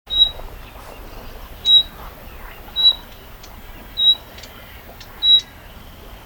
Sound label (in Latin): Luscinia megarhynchos; SETE